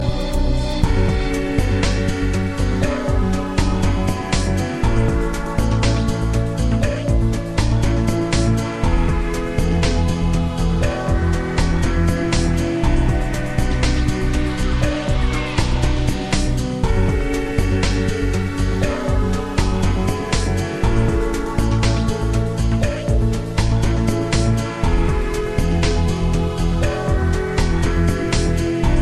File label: Sound Relax